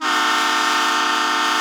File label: TRAIN; tuning